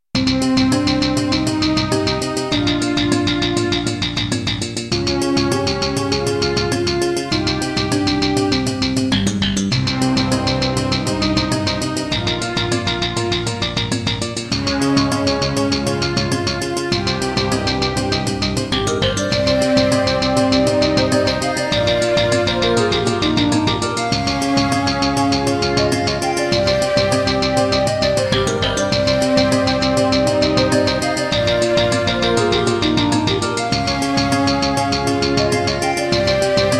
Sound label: Roland SD-90 GM2 MODE; Introduction; Hocus Pocus